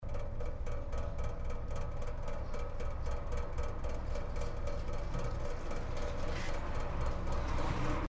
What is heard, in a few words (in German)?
Gitter, Supermarket; Ventilation; Reisholz/Düsseldorf; Christoph Collenberg